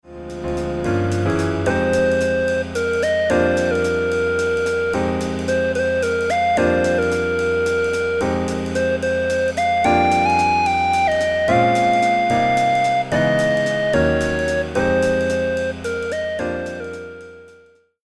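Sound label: Jazz Waltz Sketch Melody Chord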